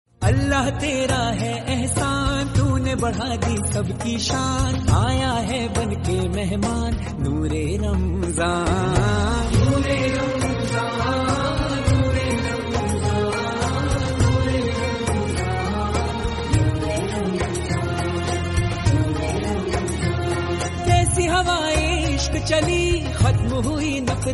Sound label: naat, islamic